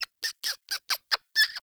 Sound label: Night Creature Close; Ambience; Night One Offs